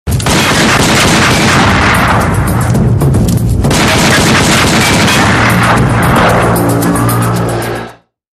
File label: gun shooting